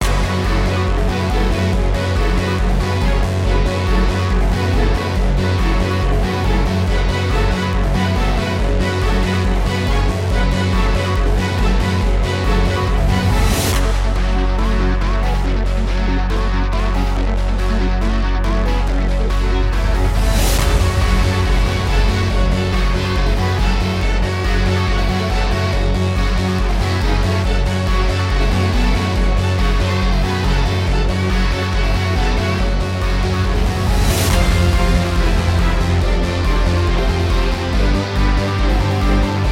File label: story battle hard; beasts